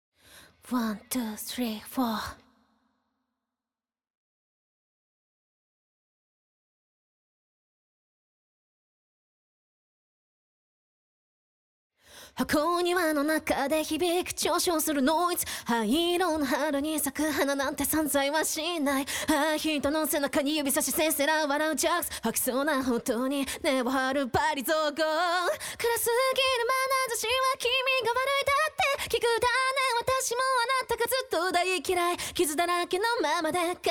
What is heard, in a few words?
Vo STEM; NCS オーディション楽曲